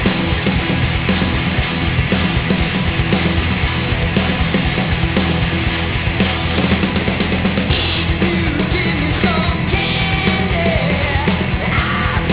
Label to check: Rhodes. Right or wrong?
Wrong